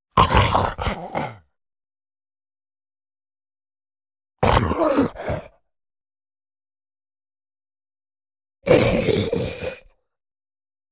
ZOMBIE MALE HUGE DYING AGONY SHORT

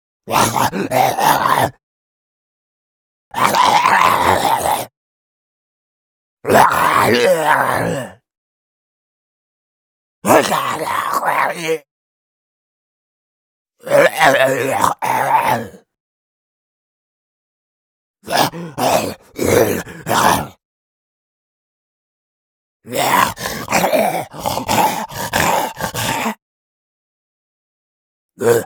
ZOMBIE MALE FEROCE ATTACK RAGE LONG

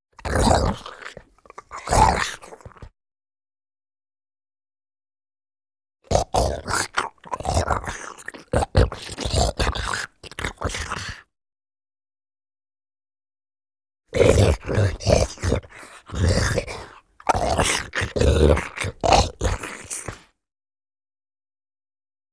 ZOMBIE MALE HUGE CHEW EATING SLOW

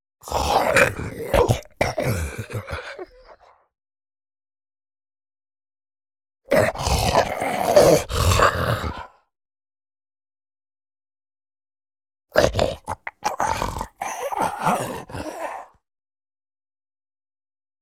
ZOMBIE MALE HUGE DYING AGONY LONG

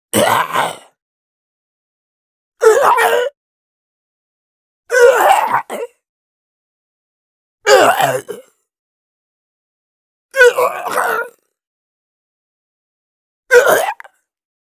ZOMBIE MALE GETTING HIT FATAL BLOW LAST BREATH

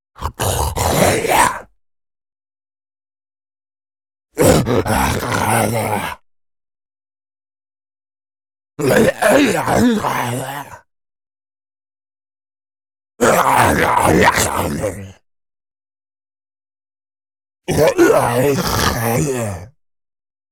ZOMBIE MALE HUGE SOFT ATTACK LONG

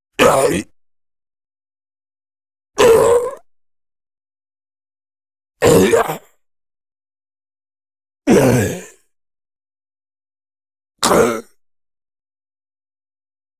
ZOMBIE MALE HUGE GETTING HIT HURTED

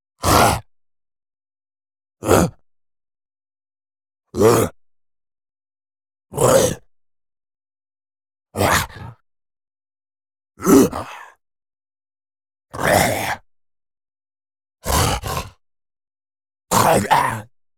ZOMBIE MALE HUGE SOFT ATTACK SHORT

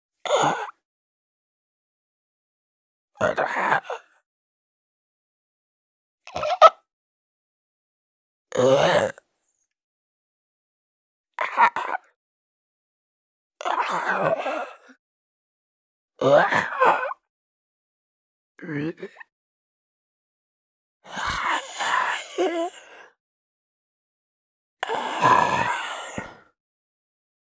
ZOMBIE MALE CALM WANDERING BREATHING GRUNT GROWL

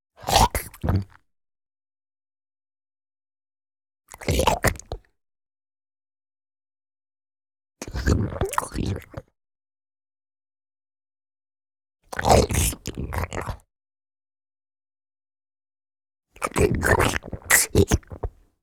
ZOMBIE MALE HUGE EATING SWALLOW VARIOUS LENGTHS; etiennebelliere

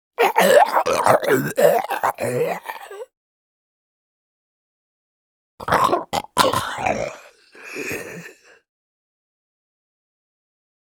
ZOMBIE MALE DYING AGONY LONG

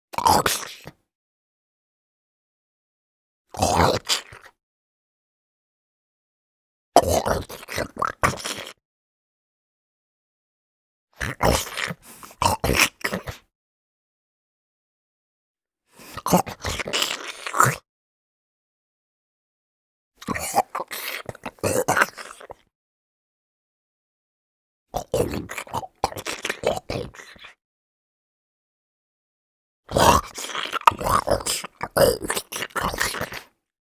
ZOMBIE MALE CHEW EATING SLOW VARIOUS LENGTHS

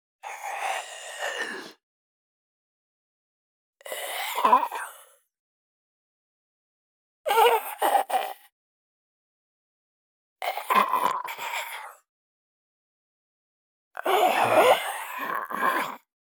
ZOMBIE MALE CALM WANDERING BREATHING GRUNT GROWL

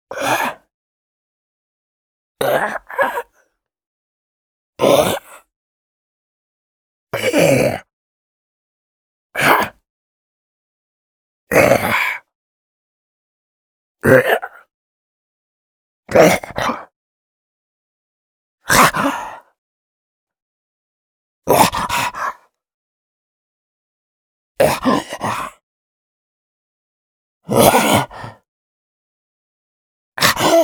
ZOMBIE MALE ALERT CURIOUS SHORT

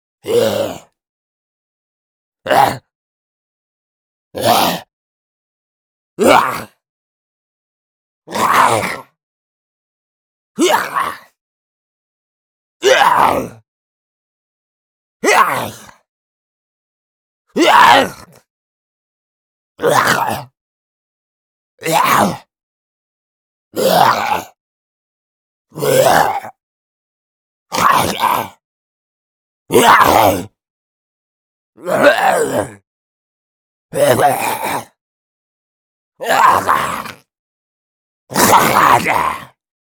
ZOMBIE MALE FEROCE ATTACK RAGE SHORT

